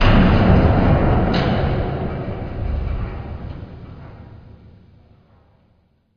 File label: engine stop; STALKER; minecraft